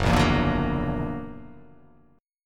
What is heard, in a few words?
F sharp Augmented 7th F sharp, chord